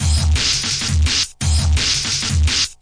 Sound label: 170 bpm distorted stepper, drum loop